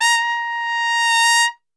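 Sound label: A#2 trumpet; Best Service; Brass Super Section; TRP SWL; 90 s Sample CDs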